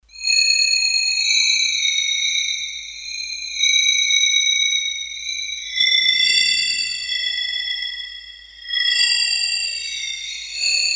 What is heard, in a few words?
Insects Sing, Roland D-10; Factory Patches